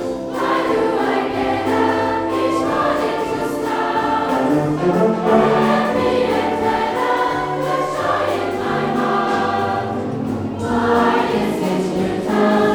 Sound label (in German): Alle Gruppen